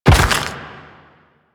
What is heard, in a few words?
Step Dirt, Footsteps; Warframe; Quests; The New War; Kahl